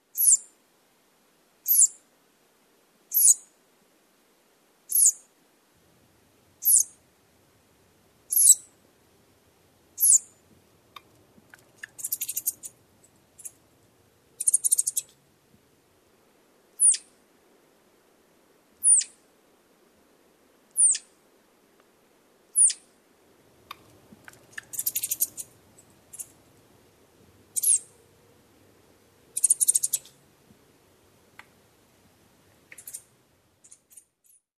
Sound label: flying squirrel; lang elliott